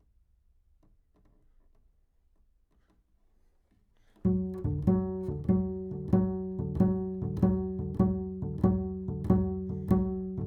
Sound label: Bass